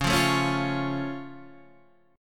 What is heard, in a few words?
C sharp Augmented, C sharp; C#+ Chord {9 8 7 6 6 9} strummed